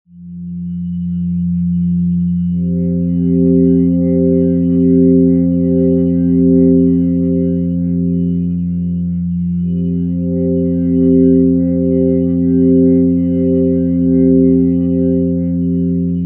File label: mystical pad